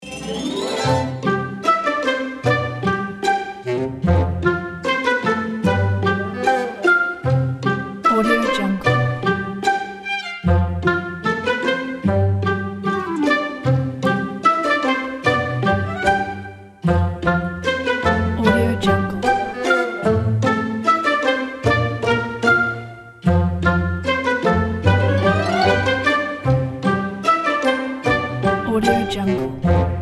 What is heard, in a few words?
curious cartoon staccatos